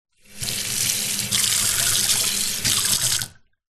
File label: household bathtub faucet on wash foot off; zapsplat